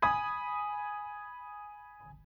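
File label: G sharp minor higher, chords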